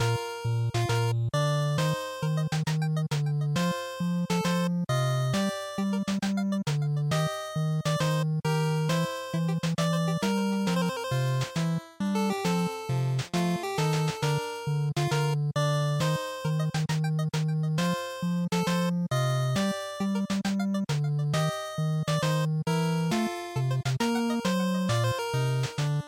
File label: RPG Title Loop, bgm